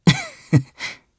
Laugh; Theo; jp; kings raid

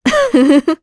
Vox Laugh, jp; voices; heroes; Priscilla; kingsraid

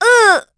Vox Damage; en; voices; heroes; Estelle; kings raid

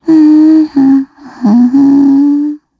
Hum; Gremory; kingsraid

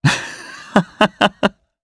Vox Human Laugh jp; voices; heroes; Shakmeh; kings raid

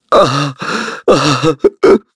Vox Human Sad; en; voices; heroes; Shakmeh; kings raid

